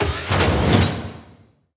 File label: Mecha factory Elevator; Kingdom Rush Vengeance